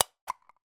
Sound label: food canned tomato tin lid peel open